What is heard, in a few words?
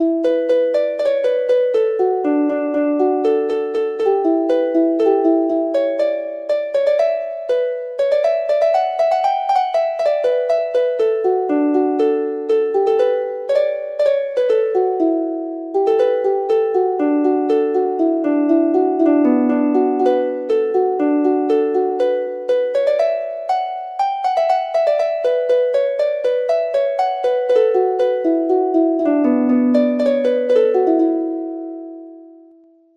harp